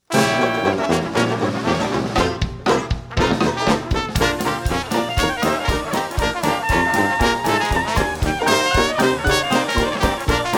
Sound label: dixieland